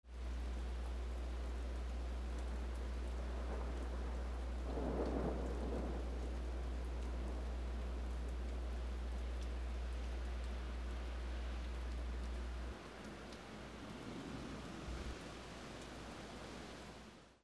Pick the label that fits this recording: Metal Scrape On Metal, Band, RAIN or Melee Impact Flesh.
RAIN